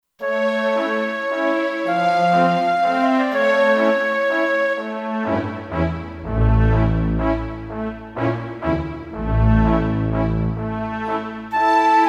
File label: Horn & Flute w Str, Kurzweil K2500X; Factory Programs